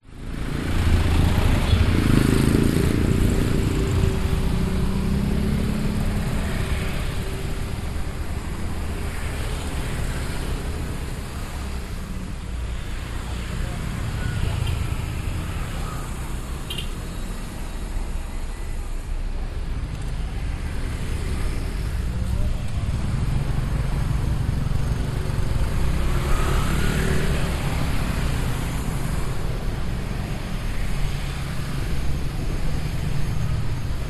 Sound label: public places urban street bali indonesia outside kerobokan prison traffic people; contruction